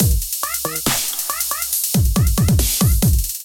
kit groove 139 bpm; Riot Kit

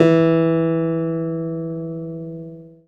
Keyboards E2; Ableton Foundation FreePacks; Model-C BD

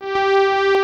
bomba G4; Ableton Foundation FreePacks; Foundation Glitch Toys & LoFi

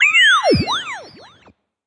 Double Tap Laser; Effects; Ableton; Factory Packs; Beat Tools

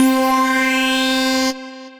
Growl, Synth Lead; Choctaw Growl

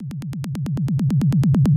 136 Techno Kick Roll; Dance DJ; Drums

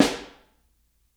SN3 Overhead, snare; Ableton Foundation FreePacks; Snare Designer

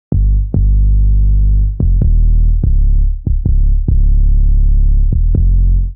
Bouncy (Cmin) 144bpm Bass Chorus; Ableton; Factory Packs; Samplification

